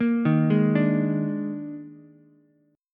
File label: Eb Major 7th Suspended 4th, Ukulele; EbM7sus4 arpeggiated; JGuitar